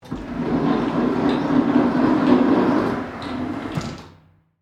Door Close Automatic, Foley, Door, Close, Automatic, Sliding; Sliding Doors